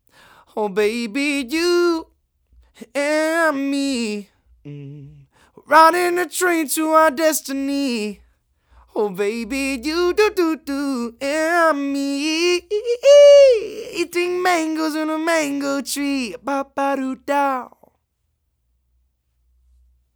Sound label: lewitt lct 240 pro male vocals; студийным микрофоном